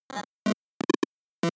broken computer random fraction

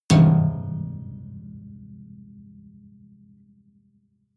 metal impact piano string damped hard

